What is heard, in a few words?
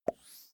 pop swoosh; ntfy